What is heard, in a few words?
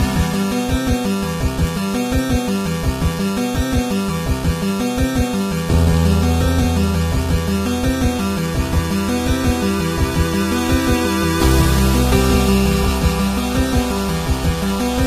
8bit; Stranger Things